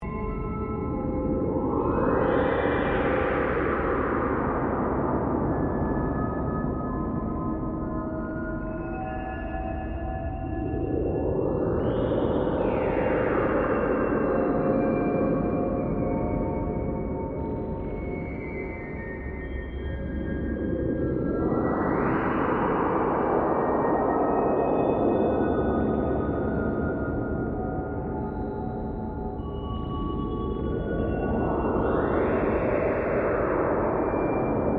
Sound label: Drone Fantasy, Scifi, Drone, Fantasy, Vintage, Time, Vortex; Science, Fiction; Sci Fi Sound Effects